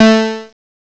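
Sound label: clav synth, ANALOG; CMI Sound Library Rev 1.3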